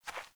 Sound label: Beaver Dig, Foley; Animals; Ambient